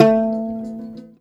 SHUR NOTE, TOPSHUR NT; Soundscan vol.60; Asian Traditions; AKAI

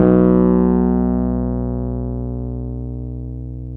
PNO, Wurly

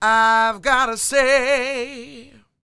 SING, Vocal Planet Blues Soul BLUESY; Spectrasonics

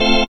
ORGAN A

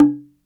Cahon Med, One Shots, World Percussion; Sony CS

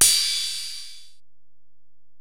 Ride, Alesis DM-PRO

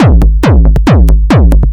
drum 138; Techno; drum-loops; xtra-drumloops

